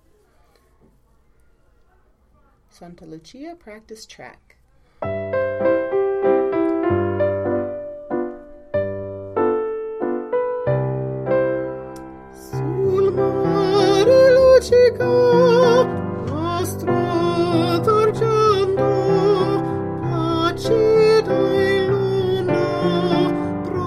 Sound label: Piano Vocal